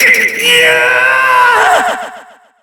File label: pain; adachi